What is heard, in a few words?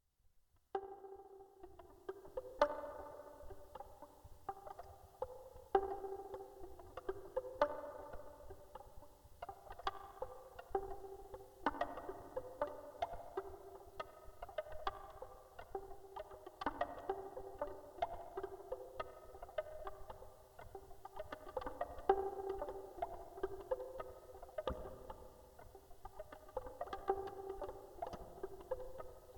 CLAMS wash noise RISE, waterline